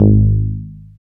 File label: BAS FM DX-7